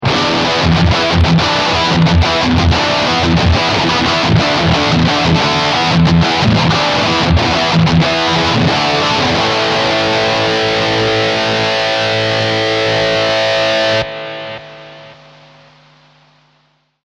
Riffing; FabTone

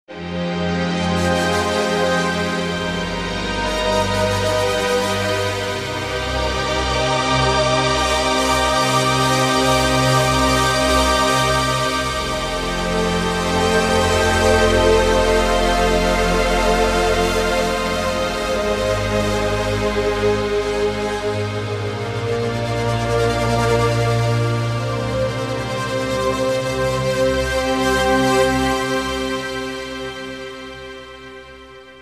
Korg T1 Octa Pad

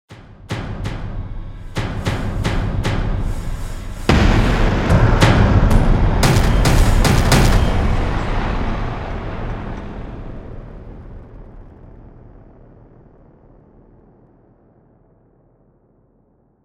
Damaged Combo; Ensemble Designer

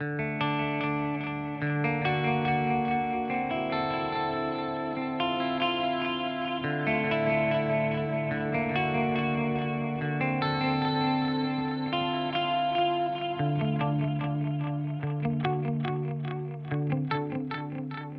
Boss GT-1000 Core Delay Echo FX

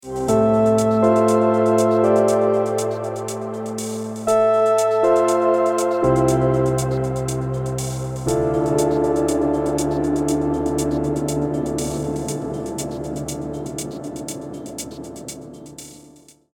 Dark Room Keysuite; UVI

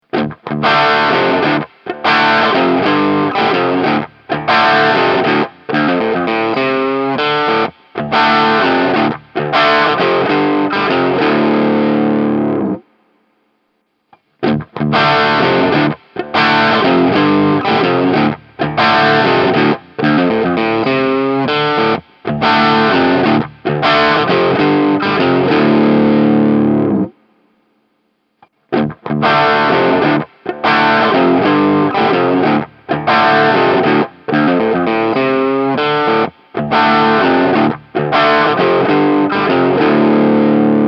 IR Cab Mikros; Hotone

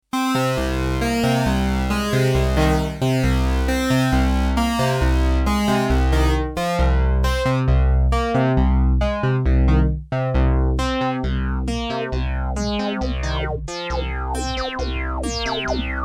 VX90 Filter

